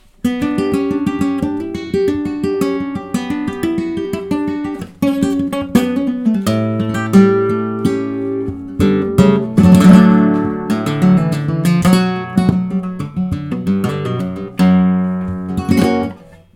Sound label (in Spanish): falseta